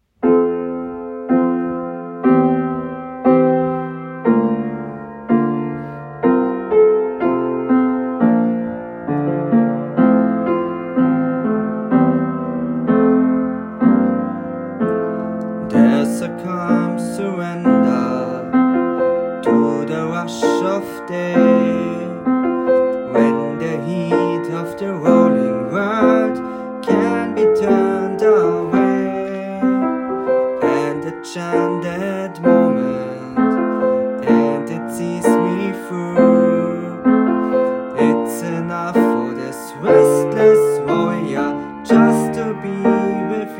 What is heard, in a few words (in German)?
Sopran